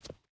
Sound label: footstep boots concrete jump start; Rust